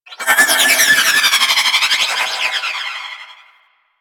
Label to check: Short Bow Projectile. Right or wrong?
Right